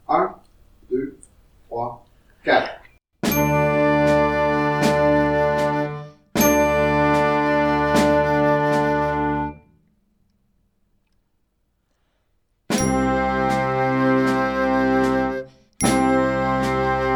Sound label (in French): respire souffle noire a 76